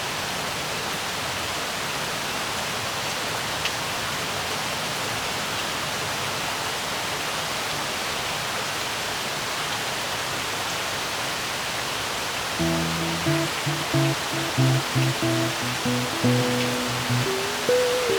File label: rain heavy